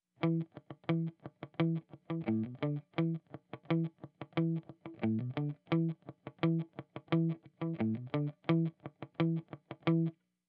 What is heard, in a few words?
delay without